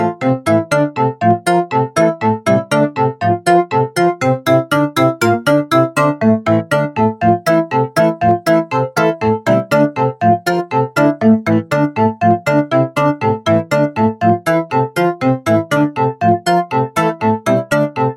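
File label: Home background music; Ludo-3D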